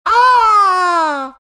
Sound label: animal sound; Beastmaster